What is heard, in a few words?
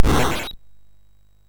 sid MOS6581R3; Gremlins 2-The New Batch